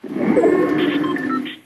storm; Fortnite

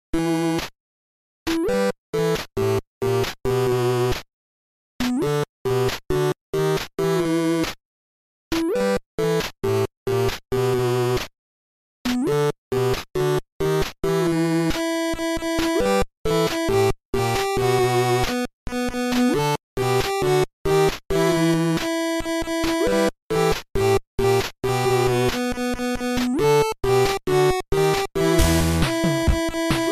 8-bit

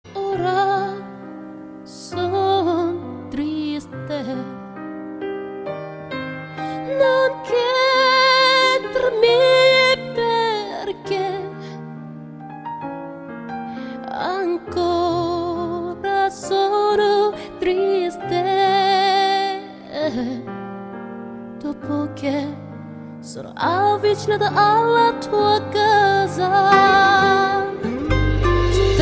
Romance And Cry

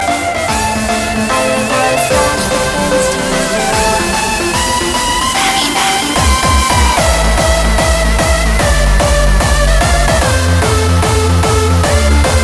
Hardstyle

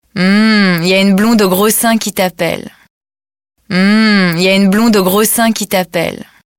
Hummm

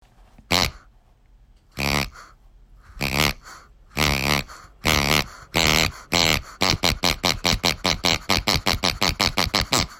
Oink

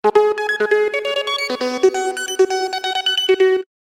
Funny Sound Message

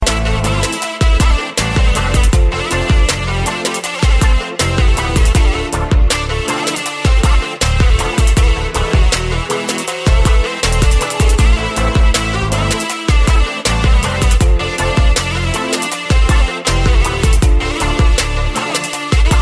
Morocco Ring

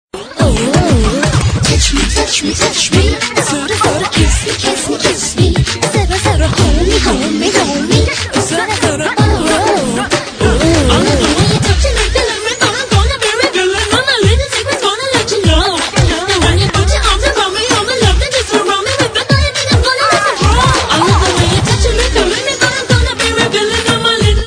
Very Funny Race Song; meloboom

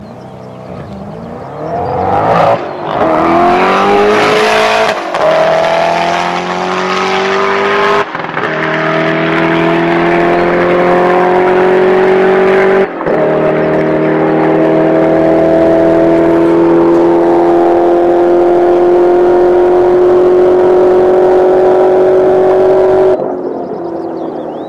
Agera R 0 - 300 Kmh

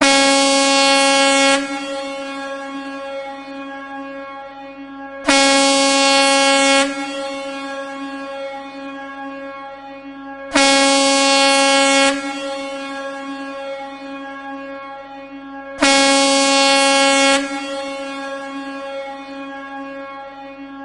Ship

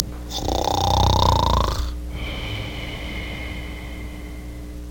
Snore Zzzzzzzzzzz

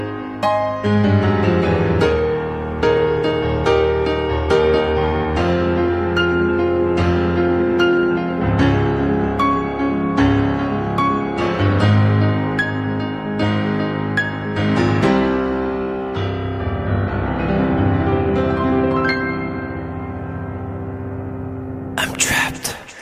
Trap Intro

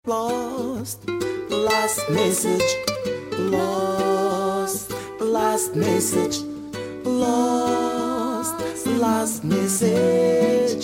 Gnawa

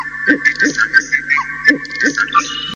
Jungle; Airtel

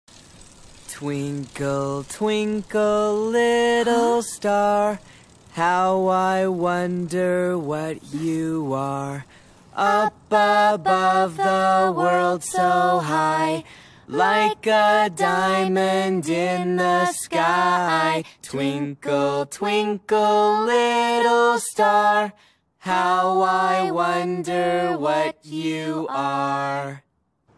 Twinkle little star

